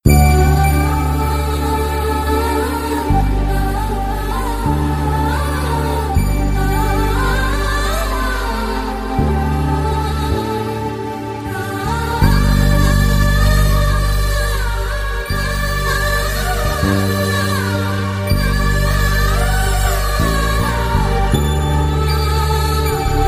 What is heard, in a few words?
sad humm; minsara kanavu